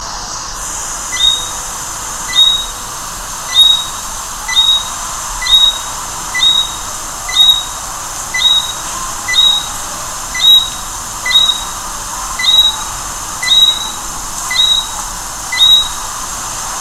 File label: Eleutherodactylus johnstonei; Curacao